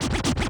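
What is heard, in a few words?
Scratch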